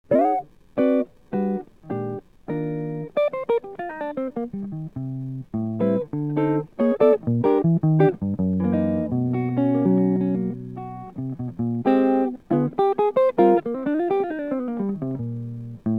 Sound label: rainy day funk